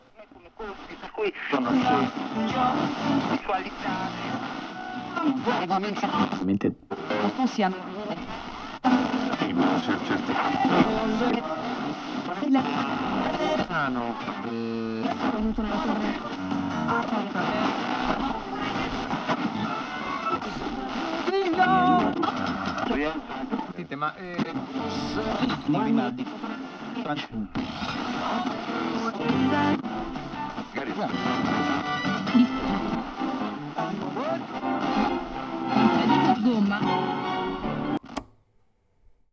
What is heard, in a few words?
Small Radio